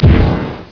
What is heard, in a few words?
poof; mk2; Reptile